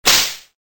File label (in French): fouet